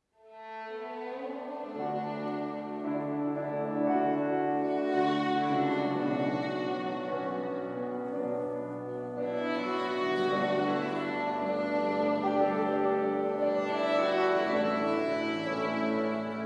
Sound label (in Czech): housle